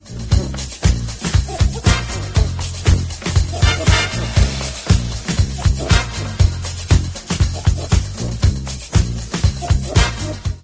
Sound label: lovebreak